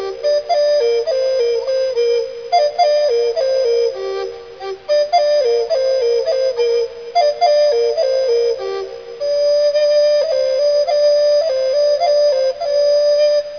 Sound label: indian flute